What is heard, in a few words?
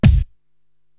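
BD, bass drum, drum machine; Korg TR-Rack; Super Fly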